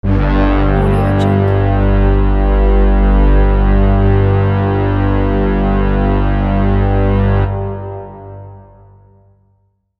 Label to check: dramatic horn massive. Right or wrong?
Right